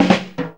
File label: fill 100; Zero-G; Funky Elements